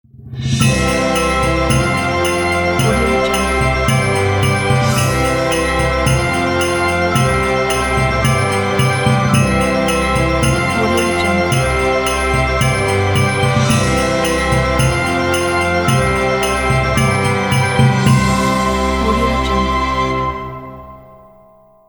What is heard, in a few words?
christmas miracle ident